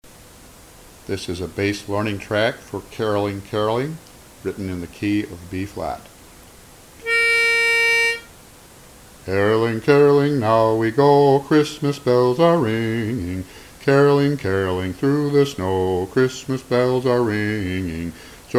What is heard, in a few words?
Bass